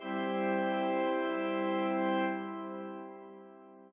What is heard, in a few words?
chord